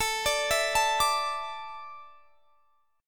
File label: A Suspended 4th; Asus4; arpeggiated; x,24,24,21,22,22